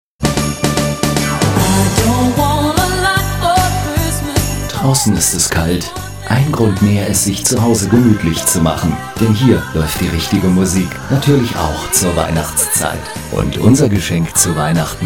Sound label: Jingle XMas; Weihnachten 2010